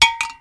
metal; Mafie 1

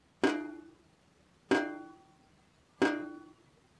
drop; Mafie 1

